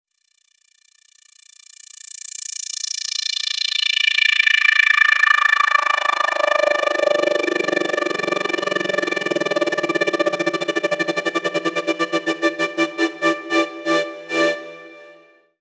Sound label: FX Uplifter